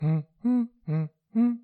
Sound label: Humhum